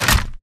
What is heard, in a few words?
Damaged Torso scrape Concrete; AI Stalker; Titanfall 2